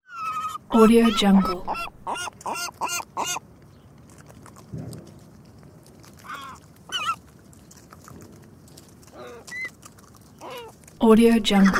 seagulls

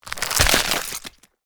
gore rip flesh; soundmorph

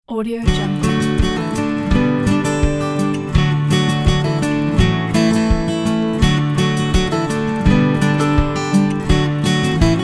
acoustic backgrounds pack

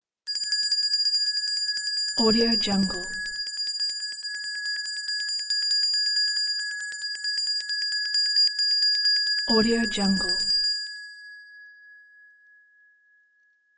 dinner bell ringing long

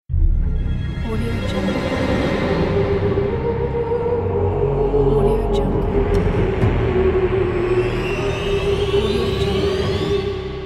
dark orchestral vocal intro logo

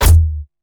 mechanism grenade launcher oomph tonal; soundmorph

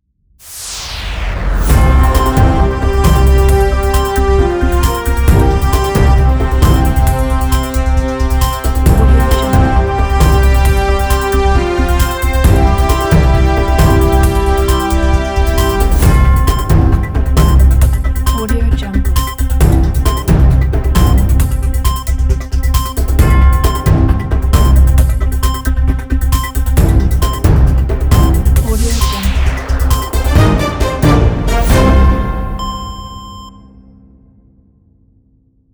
breaking news

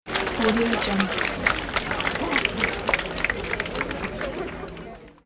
the claps

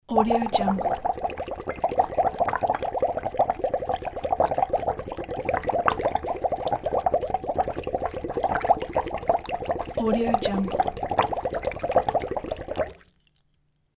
straw blowing bubbles in cup; soundfxpro

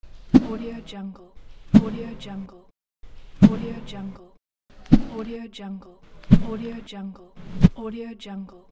swishes

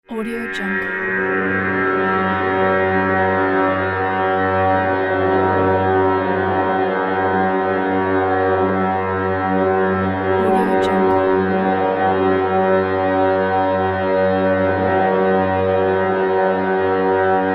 creepy low horns drone

